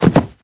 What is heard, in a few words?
Grass Jump; moul-assets